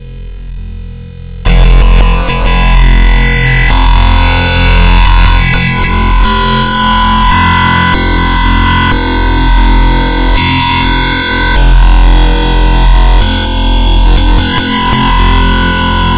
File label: Sample level synthesis using Lsystems